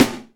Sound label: hot steel snare drum b key; snare drum samples